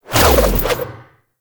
Dodge Side; Enemies; Abilities; Warframe; Tau; Dax Ninja

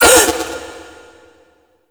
Minigame Ring Fail; Birth Minigame; Warframe; Jade Shadows

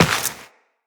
Step Dirt, Footsteps Walk; Tenno

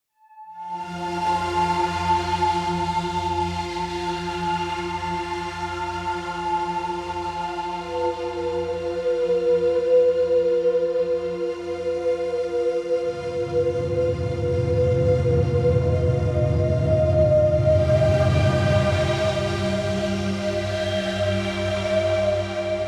Ambient Random; Railjack; Ambient One Offs

